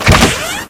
door wood open

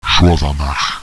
zombie alert; boomario

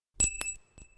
ring

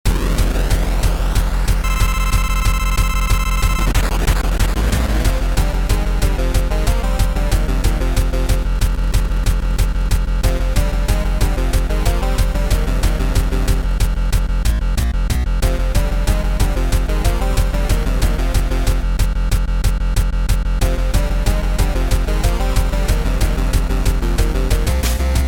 magma fusion reactor

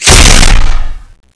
fire grenade; froster

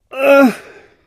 launch pain; elivance

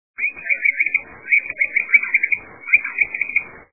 bird

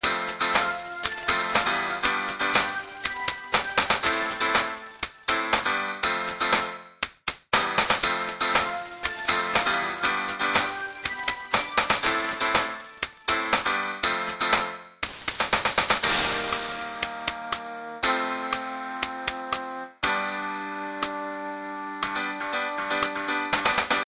lift muzak

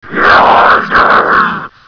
zombie attack; zombie barney

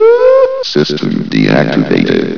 die

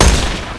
fire, weapons; Tediore; hyperion

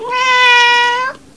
meow

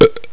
player dirt

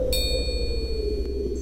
bell, christmas

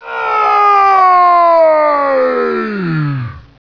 voltigore die

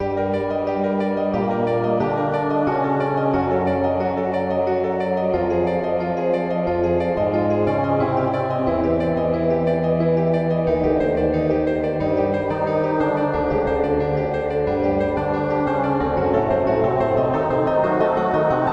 dungeon music

